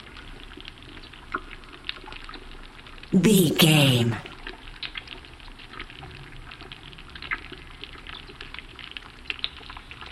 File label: cave ambience loop